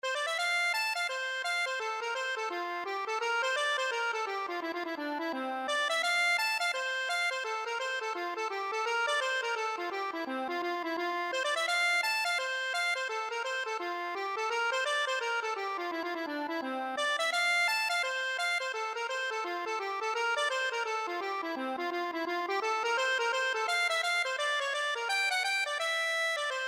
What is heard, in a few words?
accordion